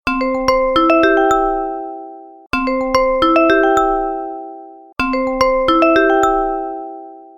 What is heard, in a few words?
notify; taxi; android; rider